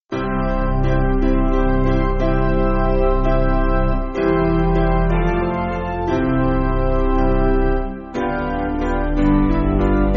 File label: Piano, Backing Files for Churches